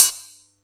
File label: Yamaha EX-5